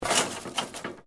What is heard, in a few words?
METAL SCRAPE SMALL; Sound Effects:Materials